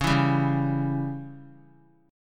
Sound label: Db Diminished Db down; strummed; JGuitar